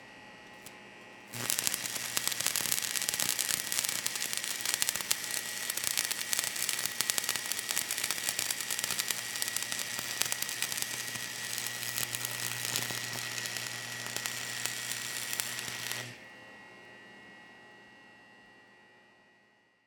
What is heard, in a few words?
Welder Run Sparks; Blastwave FX